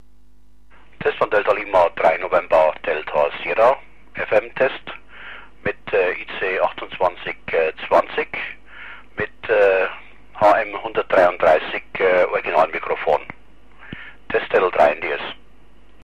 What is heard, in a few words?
FM IC-E2820 & MH-133